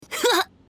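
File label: laughter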